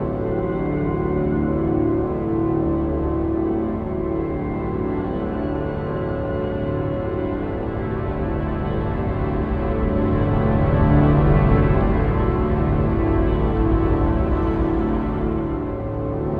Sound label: Big Wind, Acoustic Drone; DroneLoop; Long Loops; Sonic Foundry (Sony Creative Software); Friendly Fire